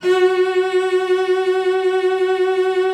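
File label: F#4, cello vibrato; Xsample VOL-7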